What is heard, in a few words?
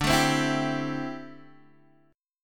D 7th Suspended 2nd Suspended 4th, Guitar, Open G